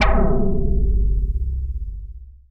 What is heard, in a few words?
bounce; Beginning Game Development With Python And Pygame From Novice To Professional